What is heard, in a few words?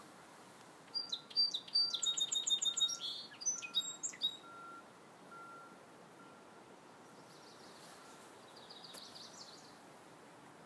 song sparrow